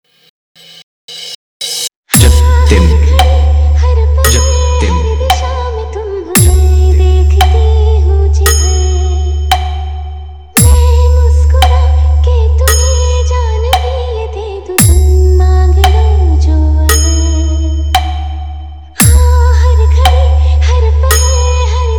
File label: Hindi Love Remix Song